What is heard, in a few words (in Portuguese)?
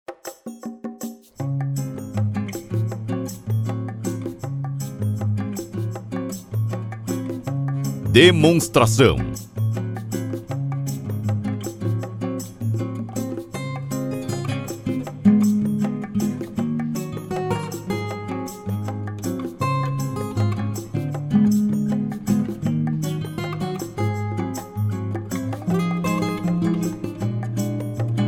Trilha